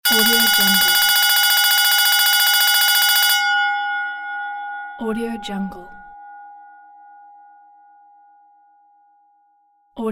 horse racing start

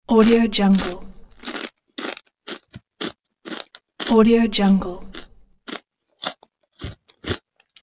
chewing

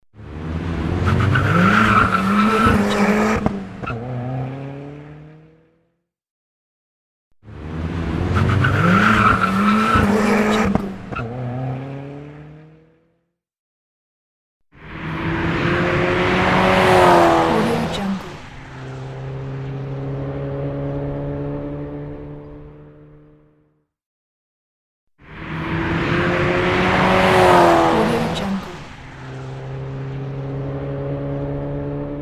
race car pack; sound food

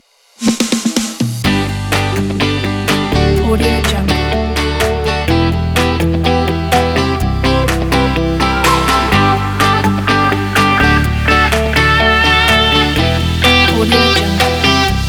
fun summer pop party

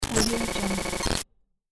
digital glitch mechanism

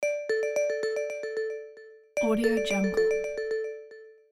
simple incoming call

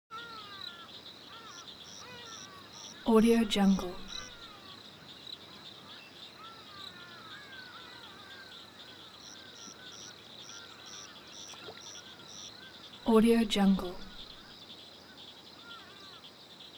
atmo rural africa winter animals busy bird; smartsoundfx

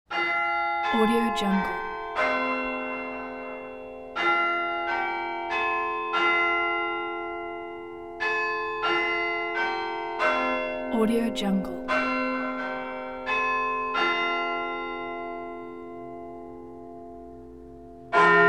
school bell; st paul; hollywoodedge

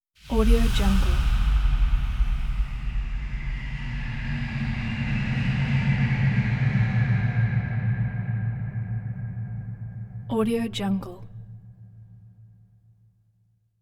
rumbling airy ambience

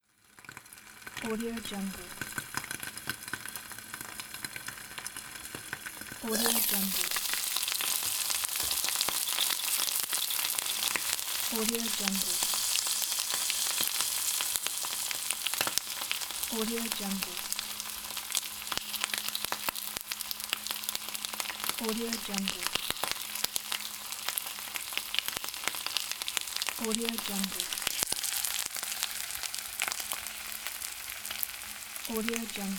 frying food

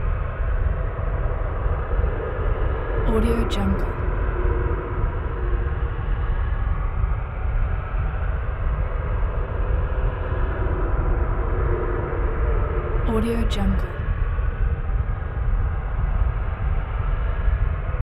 breathing presence constant airy breaths; prosoundfx

